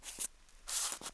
soupani; Mafie 1

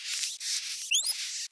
mice; Mafie 1

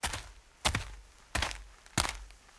kroky trava; Mafie 1

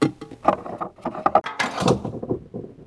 pumpa; Mafie 1

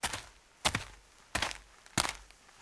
kroky; Mafie 1

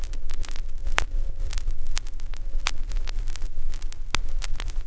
gramec; Mafie 1

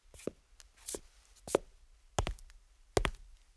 kroky; Mafie 1

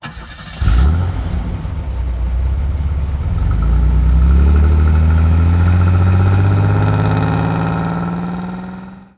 auto; Mafie 1

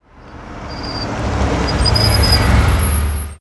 auto brzdeni; auto M brzdeni; Mafie 1

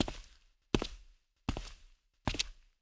kroky; Mafie 1

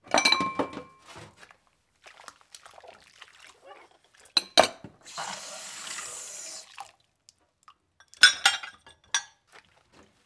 mytí nádobí; Mafie 1

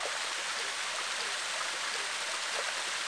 voda; Mafie 1

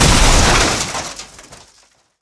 autko naraz; Mafie 1

